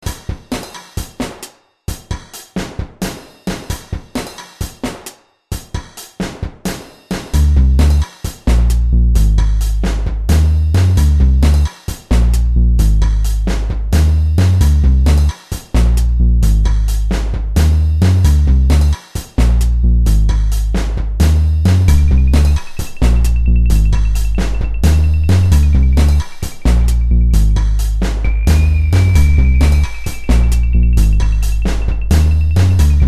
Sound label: darkbeat